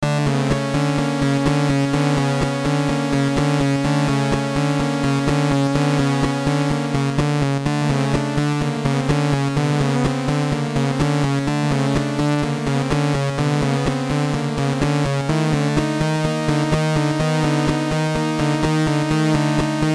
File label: BGM, WonderSwan Color; Kidou Senshi Gundam Vol. 2 Jaburo